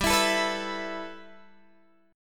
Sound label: G 6th Add 9th, Guitar, Open G